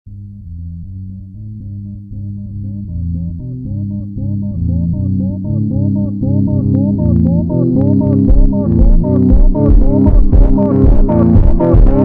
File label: slowed Reverb